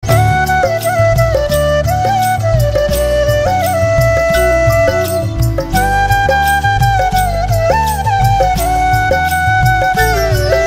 Flute Cover

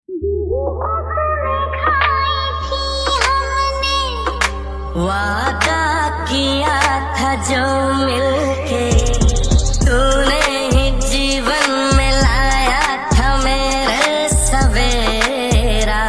Rap, ringtone

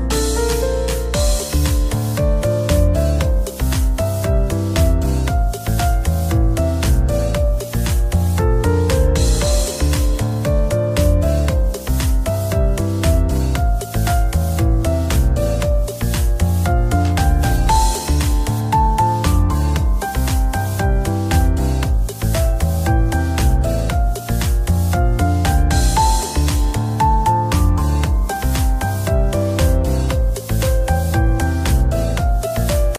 Instrumental

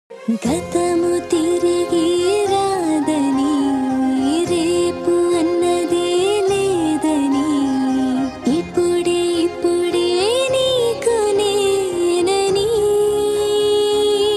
Song, ringtone; Naa Saami Ranga; female version

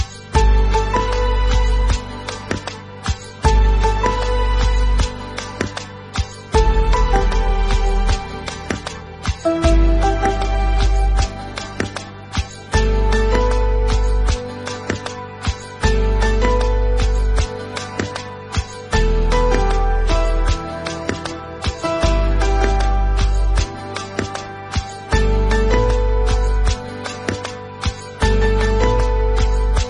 Ringtone Instrumental

